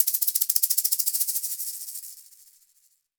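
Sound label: shaker egg, percussion; ILIO; Synclavier Percussion 2; World & Orchestral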